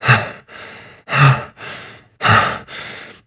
breath; STALKER; IWP

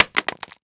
grenade wood; STALKER; IWP

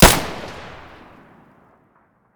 muzzle stereo, weapons; S.T.A.L.K.E.R.; IWP; fort

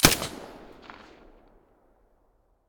mp5 silencer stereo, weapons

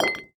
bolt glass, material; STALKER; IWP

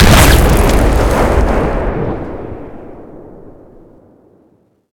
rocket explosion

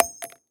Shell heavy carpet; STALKER; IWP

